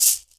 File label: Shaker; Equipped Music; Loungin House